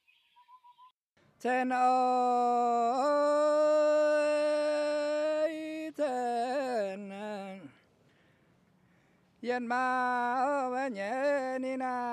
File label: Mien song, tribal